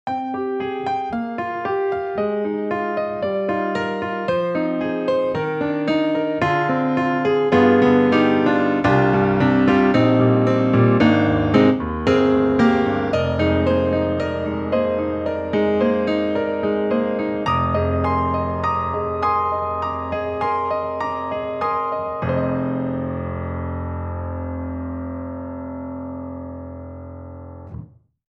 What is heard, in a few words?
Grand Rhapsody Piano Basic Grand; Waves